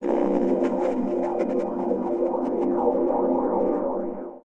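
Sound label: kraken leg attack, monster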